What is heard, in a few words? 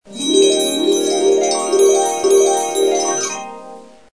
magic; funny